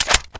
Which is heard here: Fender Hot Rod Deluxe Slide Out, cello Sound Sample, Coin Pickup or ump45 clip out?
ump45 clip out